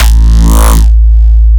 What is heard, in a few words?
BASS SHOT rewr